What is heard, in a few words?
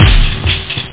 dance loop cs drum, snare samples; Amiga 8-bit Sampled Voice